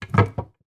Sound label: household bread bin lid put on